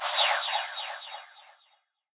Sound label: pewhoosh